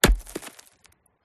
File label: impact brick rock dirt gravel single hit; PMSFX